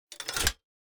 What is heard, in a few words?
gate down